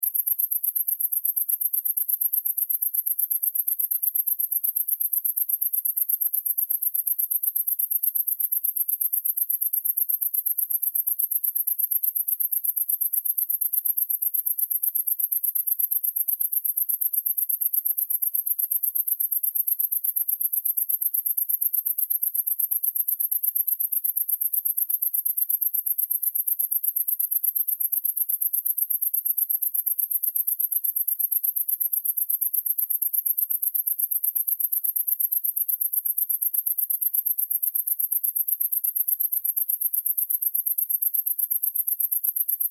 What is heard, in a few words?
animals insect cricket, Insect, Cricket Chirp; Insect - Cricket Chirp - Loud Clear And Isolated Cricket Chirping; Insects